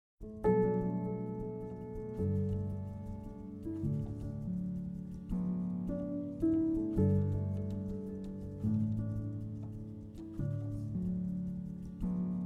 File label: piano diary